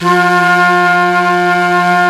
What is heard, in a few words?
flute vox, singin' flute